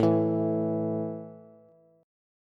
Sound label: A 5th A down Bass; strummed